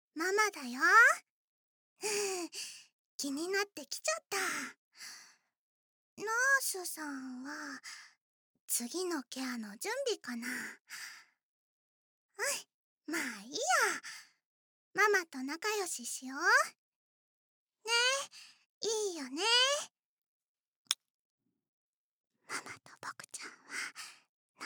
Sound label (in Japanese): 癒しの耳舐め,ASMR; 菜々子ママ; サークルナース